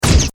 UI shot; Paradise

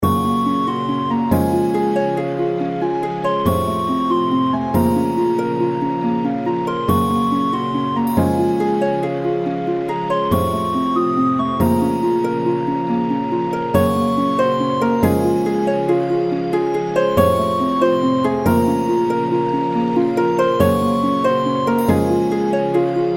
outro; Stonehenge

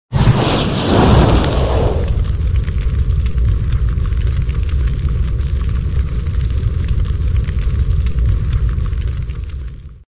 buff fire; Thunder Fighter

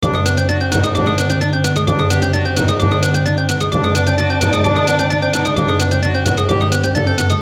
count up; Book Of Queen

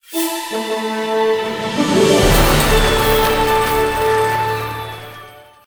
WIN MAX; games; Always Hot DX

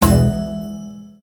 win skip; Zhao Cai Tong Zi

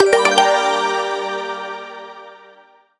multi line win; Sizzling 777; wazdan

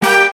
bonus chance; Lighting Hot

